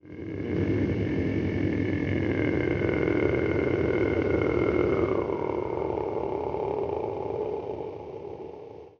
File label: VOICEFX ETHNO 160; eJay